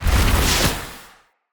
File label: Stone Cast; Fey; Ward